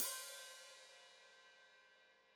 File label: Hat Foot Open, Hi-Hat; Deeply Sampled Drums